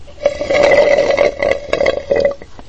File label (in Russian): hlebanie trubochka po dnu